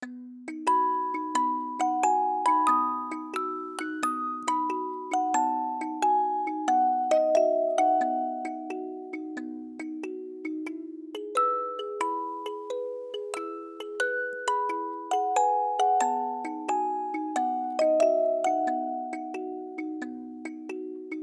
Music Box

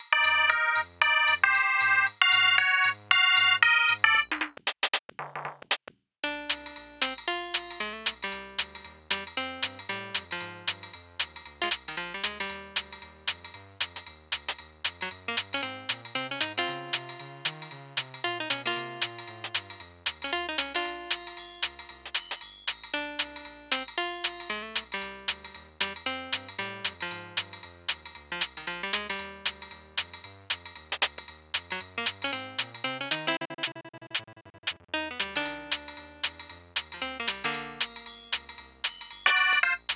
Jazz, Aircheck, Cheap

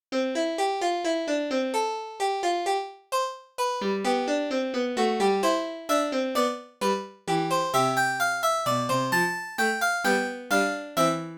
Fugue, Aircheck, Hi-Fi

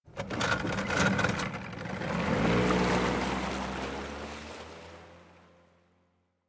Boat Start

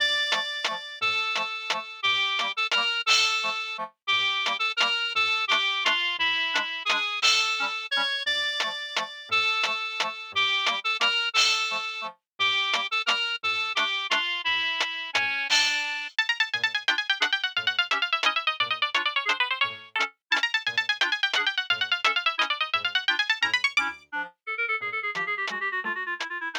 Waltz, Aircheck, Cheap

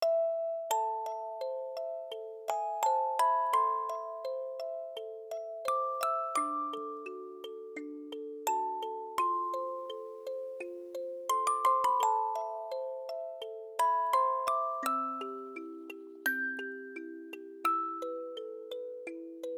Western (Music Box)

